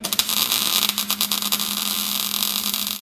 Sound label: Electric static spark